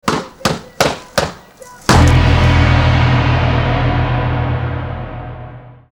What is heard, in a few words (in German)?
Gong Klingelton; ringtones